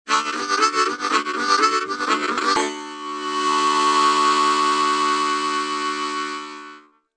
harmonica

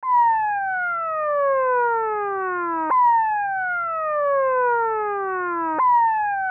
ambulance

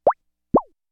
waterdrop

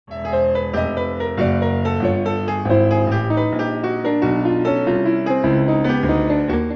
piano

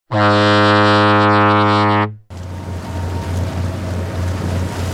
ship

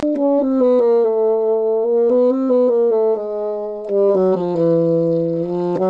basoon

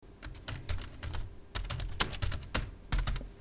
keyboard

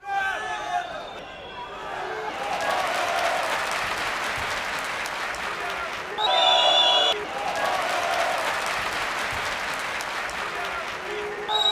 football

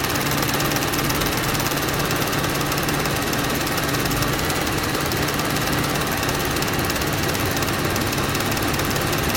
projector

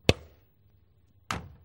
cricket

